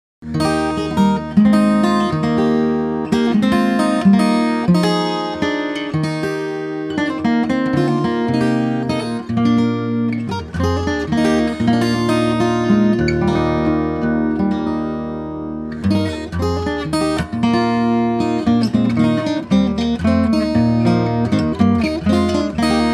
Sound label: lute galliard